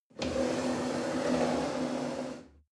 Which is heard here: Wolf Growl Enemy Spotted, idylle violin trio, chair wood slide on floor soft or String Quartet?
chair wood slide on floor soft